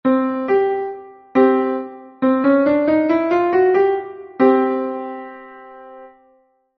perfect fifth

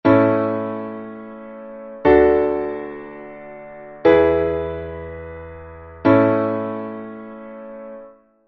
A major three chord

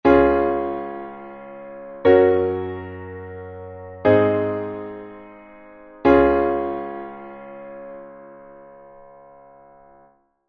C major three chord

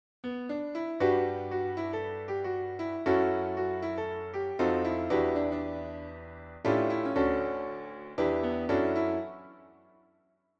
subV7

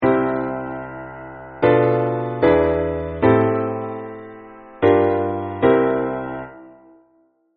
chord progression

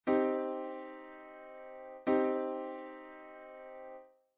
C minor chord

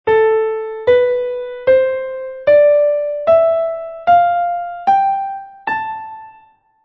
A minor scale

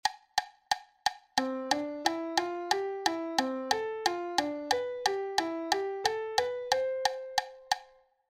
syncopation

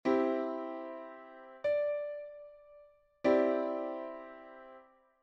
Cadd9

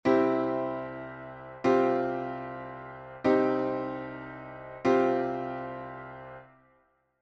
C and Csus4 and Cm

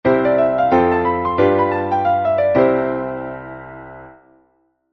C major

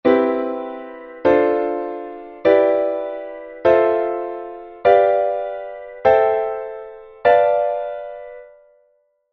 C major seventh

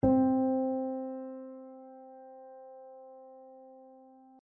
piano C

